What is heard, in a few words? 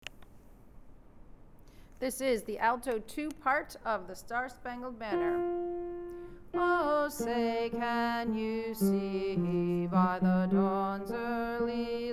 alto; Star Spangled Banner